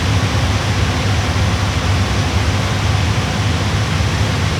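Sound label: Plane P40 Interior Inflight Loop; Airplanes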